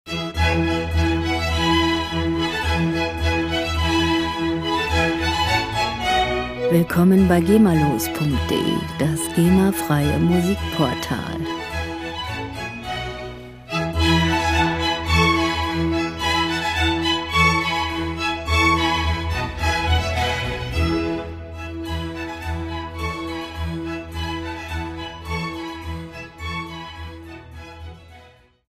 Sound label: vivaldi spring